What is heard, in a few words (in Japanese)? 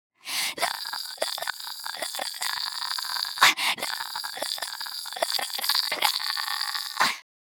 ブレス音; teto sv2 review